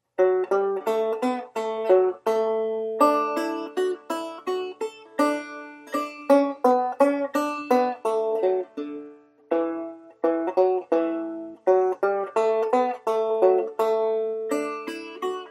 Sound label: bonaparte melody